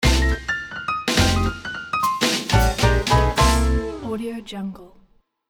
jazzy jam logo; drtimwaters

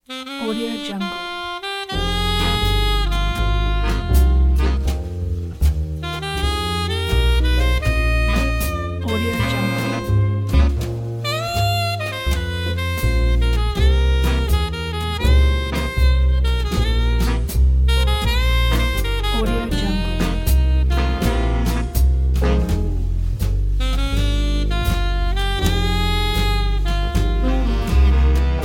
private eye jazz; griptionmusic

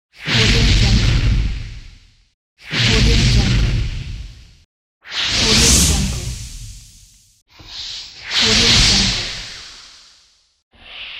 flames of flame

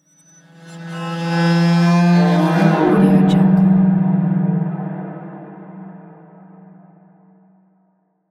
asylum melodic slow riser drone sweep; esmteam

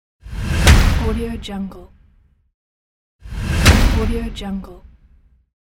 unlock achievement

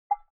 elements water dabble single glas bowl hard; smartsoundfx

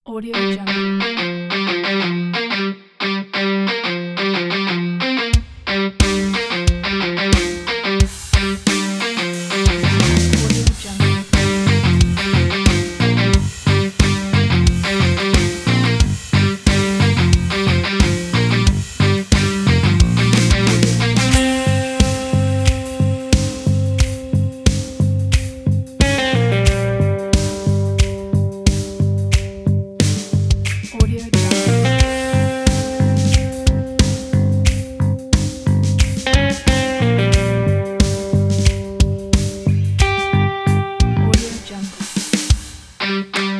samurai action rock

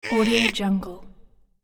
cartoon character effort

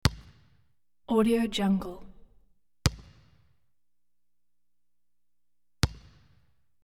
basketball bounces dribbles

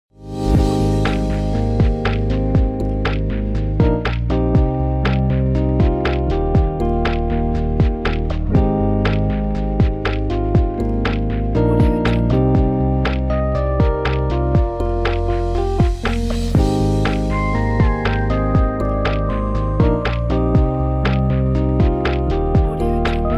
lofi chill intro

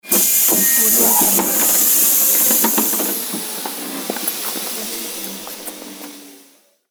pneumatic machine

